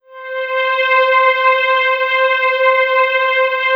PAD, Drum & Bass 170 BPM; Ueberschall; Drum & Bass Flash Trackz

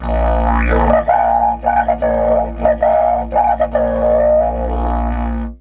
WILD, Didgeridoo; Big Fish Audio - Didgeridoo and Other Primitive Instruments

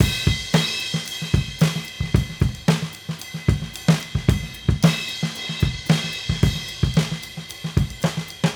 Raw Funk Drums, 70s Analog Funk

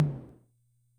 Tom, rhythm 55

